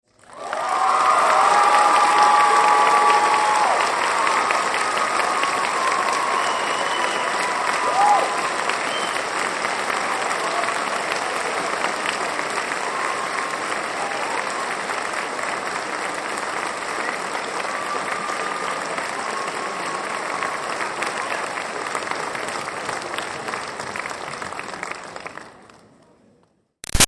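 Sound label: Crowd Applause Cheers Audience Graduation Long; Auditorium; Human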